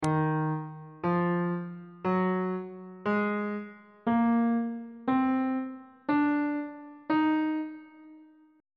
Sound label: d sharp melodic minor scale on bass clef